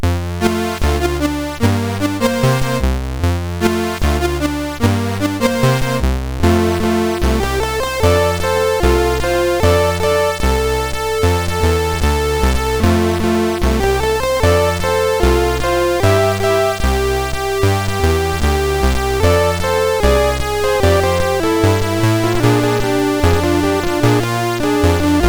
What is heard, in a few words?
See-Saw, MOS6581; MOS6581R4